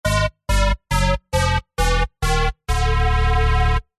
multimedia game sound fun arcade organ short negative fail lose